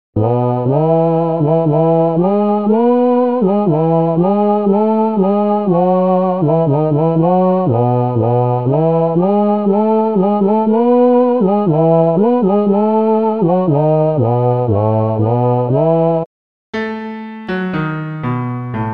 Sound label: Tenor; Avondale